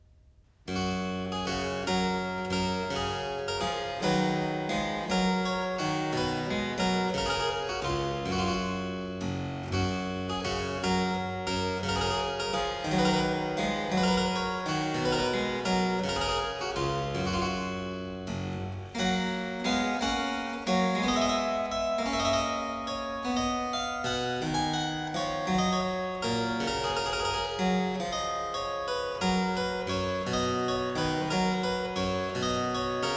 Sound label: new Irish tune, Miscellaneous pieces for the harpsichord; Complete Chamber Music; Brilliant Classics